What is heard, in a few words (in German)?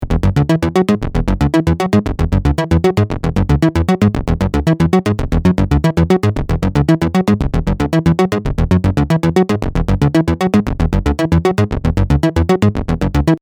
Basslauf; system700